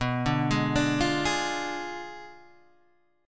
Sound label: B Diminished 7th B down Guitar Open G